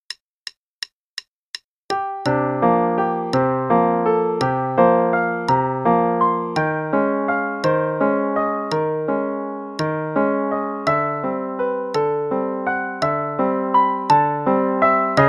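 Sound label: Play-a-long, Waltz, dotted half=56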